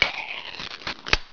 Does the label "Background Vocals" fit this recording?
No